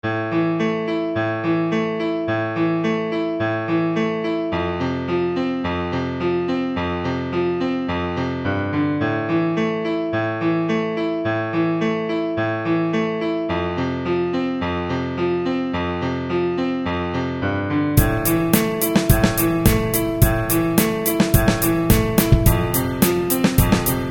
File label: guitar